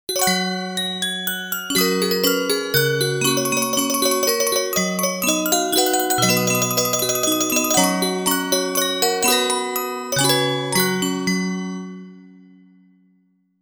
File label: BGM Cute